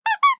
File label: Zombie hit